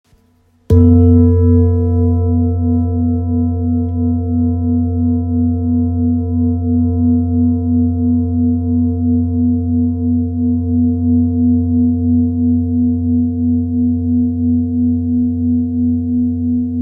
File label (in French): Bol ramgandi fa